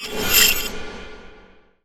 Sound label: arc chains; weapons; dota; pudge